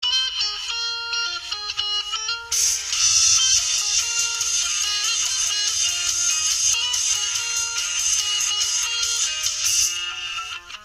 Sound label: Nokia Ringtone; 3.1 Plus